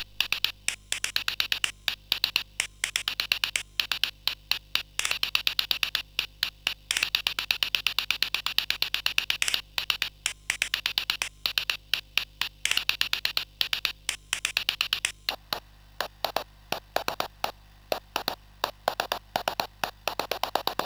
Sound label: Stepper, CSG8580R5; sid